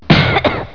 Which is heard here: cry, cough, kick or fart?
cough